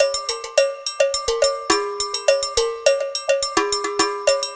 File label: ringtone; SnootGame